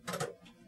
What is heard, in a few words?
gearclick, train; OpenBVE